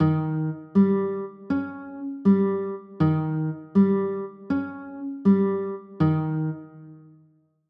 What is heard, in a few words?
d 7 sharp 9, chords